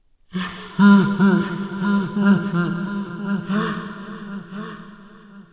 Laugh Giggle Girl; Five Nights at Freddy's; FNAF

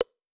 Dialogue Text End; helltaker

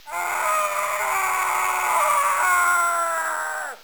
Scream! (SFX); Mario 3 Around the World; Genesis

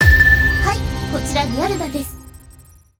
Home Menu Banner

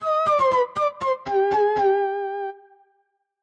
Flute Jingle; Kharon's Crypt

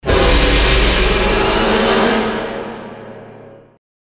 Scary Stinger; ratchet clank into the nexus

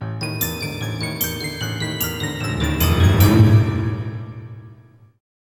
Chicken March Stinger; medievil game rip

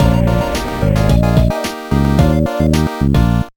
Promotion; the urbz sims in the city; gba

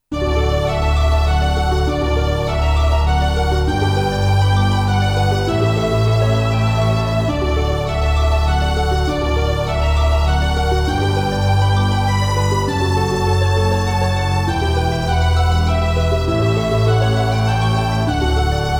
Romantic; princess maker faery tales come true; windows

